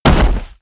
fall

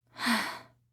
sigh

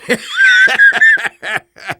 evil laughter; engineer

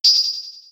shine; gaspen

